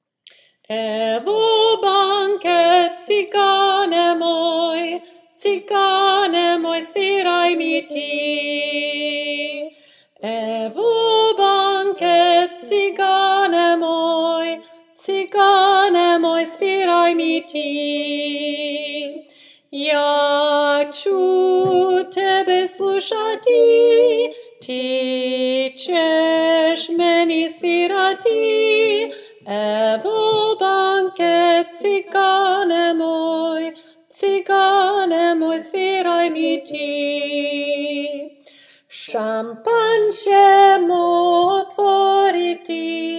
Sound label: evo banke soprano